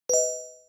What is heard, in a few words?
Gem Collected; Platformer1